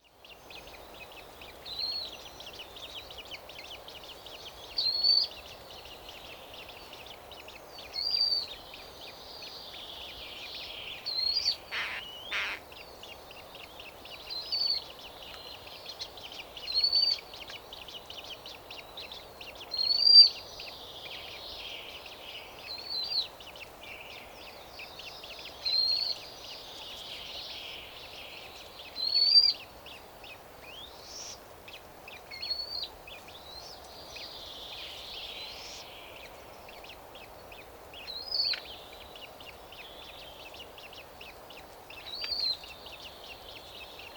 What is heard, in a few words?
Water Rail